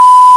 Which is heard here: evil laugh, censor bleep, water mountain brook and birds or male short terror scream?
censor bleep